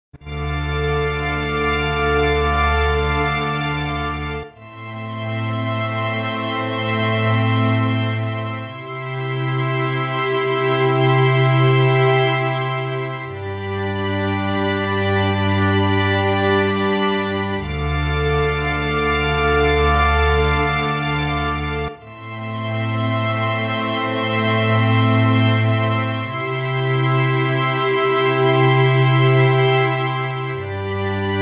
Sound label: Sad Violins; MOVIEMUSIC